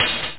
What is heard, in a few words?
hihat